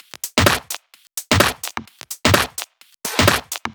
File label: Loop, 128 BPM